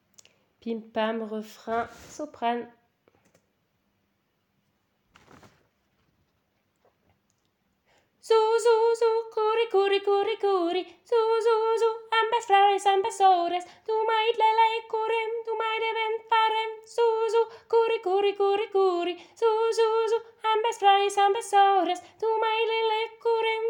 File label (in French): refrain soprane, pour chanter entre femmes